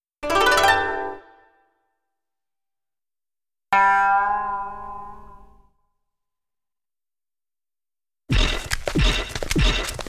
Epic Battle ~Single Engine Plane~

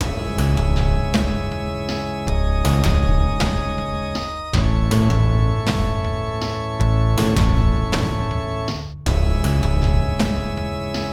Cemetery Loop

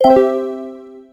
Magic Harvest; the princess and the frog; Swamp; Magic Add

